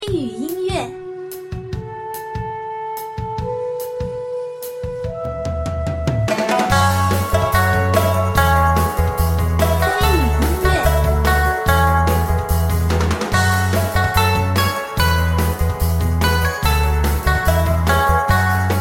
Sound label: PVE Zhan Dou; Shen Xian Dao; Light Rain Music